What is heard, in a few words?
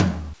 TOM